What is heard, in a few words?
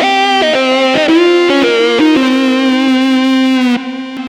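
extra lead (melodic); Sonic Foundry (Sony Creative Software); Ugly Remnants VOL-2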